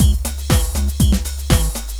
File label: drumloop; awe32